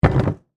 Impact Heavy Object, Set, Down, On, Wood, Table, Hard; Miscellaneous Impact